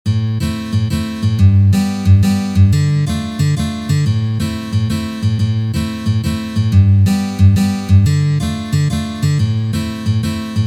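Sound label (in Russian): boj dlya kupleta, na gitare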